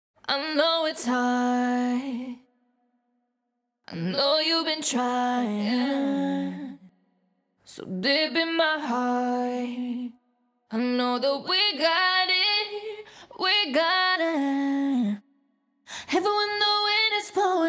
WET ACAPELLA (EMaj) BPM123, Pop Vocals; RAIN; Acapellas

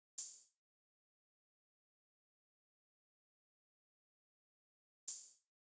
Drum Loop 98 BPM Open Hat; Loop Stems; Cymatics; Vibes II Drum Loop Collection

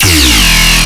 Bass Hit E, Dubstep; Vortex Void; Ghosthack; Demolition

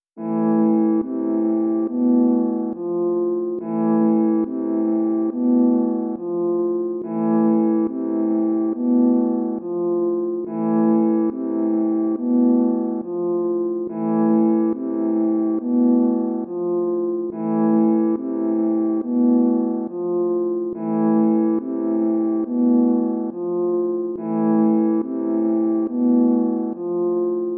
140 BPM A Min Piano; Cymatics; Fearless; 2022 Melody Collection; Drill

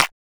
Small Clap; House; Drum One Shots; Claps; Cymatics

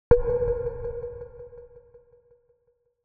Stretchy

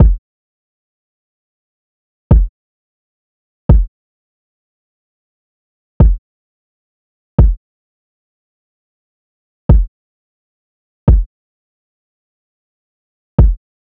Drum Loop - 130 BPM Kick; Loop Stems; Cymatics; DOPE DRUMS VOL. 1; Flavor Drum Loop

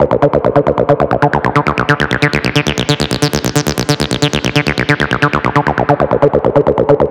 Synth Loop D 135, Techno; Ghosthack; AC2024 - Day 1 - Techno Sounds 2025

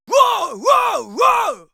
Yells, Viking Vocal

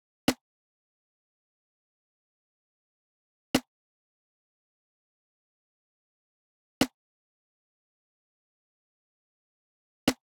Drum Loop 147 BPM Percussion; Loop Stems; Cymatics; Vibes II Drum Loop Collection; Sparkles

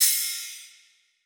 Ride, cymbals; Countdown; Cymatics; Drum One Shots

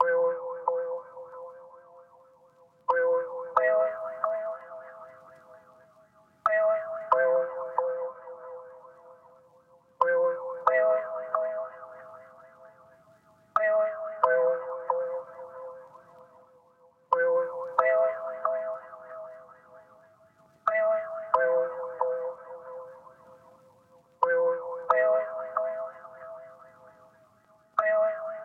135 BPM F Min Bell; Loop Stems; Cymatics; Agua

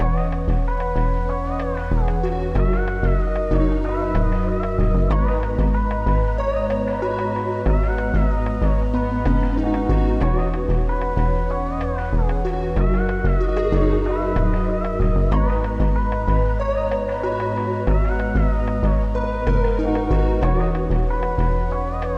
94 BPM G# Min, Melodies; Cymatics; GHOST (Beta Pack); Freedom

